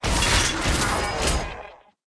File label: dead, monster